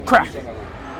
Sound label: bat noise